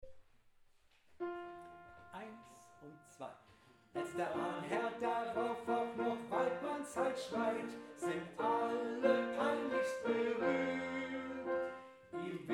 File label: tenor